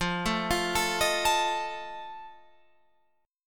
F 7th F down Guitar Open F; arpeggiated

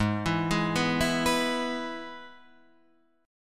Ab Minor Sharp 5th Ab down Guitar Standard; Abm#5 arpeggiated; JGuitar

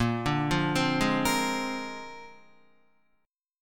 Bb 9th Bb down Guitar 4F,4A,5C,5G,5C,6E; arpeggiated; JGuitar

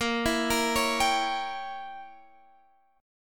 A sharp Diminished 7th, Guitar Open G; arpeggiated

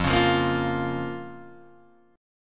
F Suspended 4th Sharp 5th F, Guitar Open G

